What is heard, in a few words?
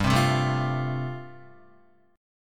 G 9th Suspended 4th, Guitar; G9sus4 strummed